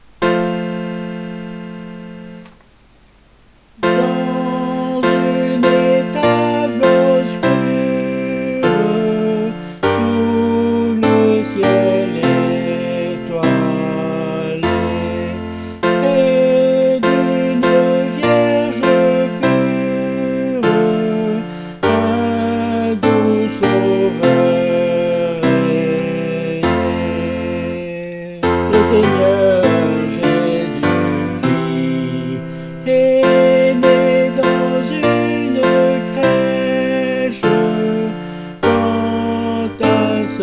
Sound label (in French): Dans une etable obscure piano et soprane